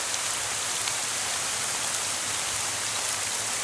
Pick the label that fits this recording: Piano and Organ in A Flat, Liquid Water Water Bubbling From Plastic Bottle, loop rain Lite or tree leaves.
loop rain Lite